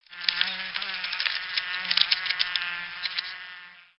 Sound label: Jungle Insects; gnarled forest